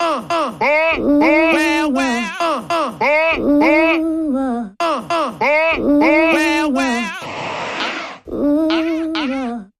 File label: R & B Vocal Variation